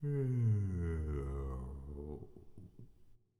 kaiju adult ship entity closing; biogenesis

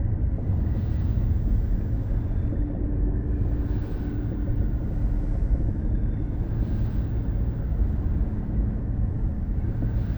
juggernaut idle; aquatics

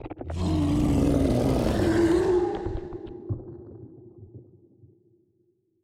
space dragon attack; Stellaris; reanimated

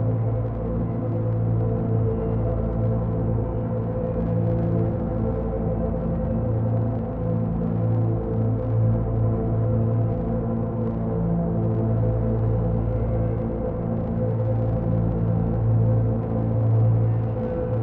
ship colossus idle; ships; Stellaris; shroud

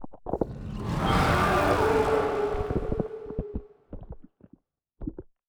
aqudragon reanimated attack; Stellaris

